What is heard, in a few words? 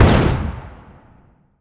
ship hit small effect; Hearts of Iron IV Death or Dishonor